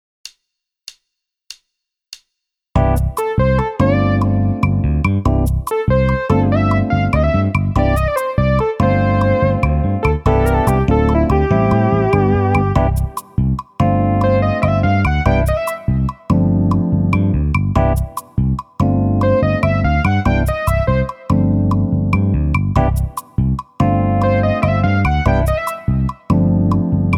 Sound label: Backing Slow 8th Shuffle